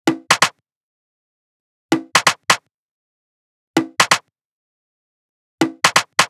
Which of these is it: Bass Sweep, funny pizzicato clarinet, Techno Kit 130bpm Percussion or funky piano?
Techno Kit 130bpm Percussion